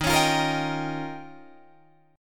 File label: Eb 9th, Guitar, Drop D